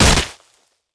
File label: Body Fall Dirt; Blastwave FX